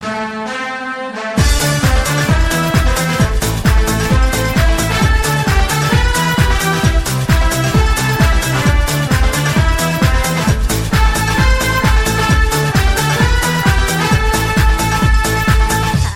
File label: samba